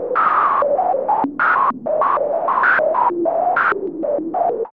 RANDOM FILTER, RHYTHM; AMG - Vince Clarke Lucky Bastard

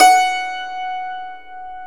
Hammer Dulcimer F#3; Planet Earth; Digital Sound Factory Planet Earth

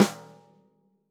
snare snares on; JazzFunkKit

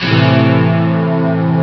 stack; ST-XX Amiga Soundtracker Sample Packs

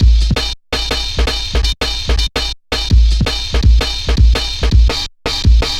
drum loops 165.5; Klanga; Jungle

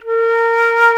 flute A#2, ebony flute; InVision Interactive; Lightware VOL-1; Instruments & Percussions

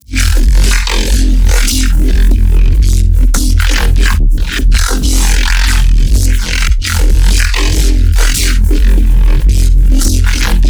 Neuro, bass

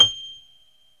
DBL GRAND; OMI - Universe of Sounds

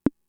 Conga, Fricke MFB-522

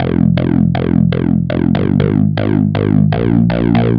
Storm Bass, Bass Foundations; Sonic Foundry (Sony Creative Software); The Electronic Music Manuscript Collection

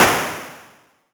Elektron Monomachine, Drum Machines

